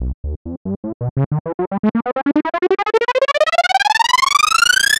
aggressive electro house riser; 5s

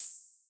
classic house open hi hat

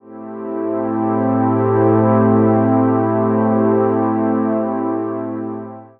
lush retro synthwave pad chord in Am

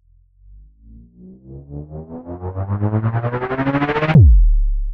dark cinematic impact riser; 5 seconds

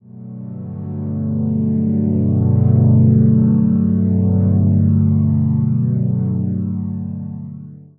soft ambient pad with slow attack

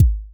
analog 808 style kick short tight

